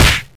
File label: Punch Sound Effect